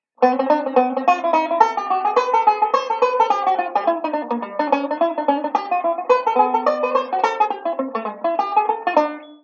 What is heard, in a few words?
I V I Etude jazzy, banjo; Beyond Chord Melody Volume II